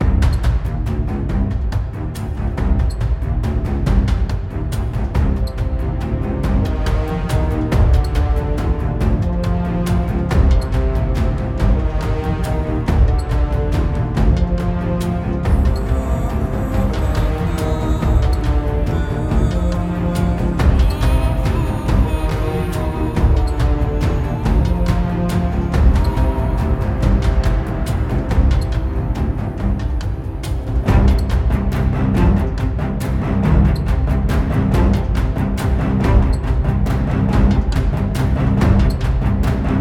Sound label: Action 140bpm High; project zomboid